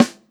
Snare Rock; HeighHoo

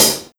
Hi Hat Closed

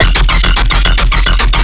Animals

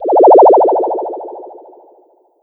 Sound FX Flyby Hi Tech

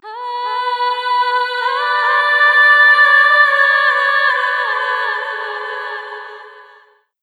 Vocal Ahh; Matrix

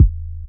Kick; Doctop; Ghost Fader; pitchglitch samples

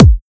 Kick

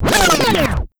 Vinyl Backspin

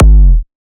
heavy; foxdot default

